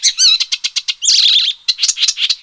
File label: BIRD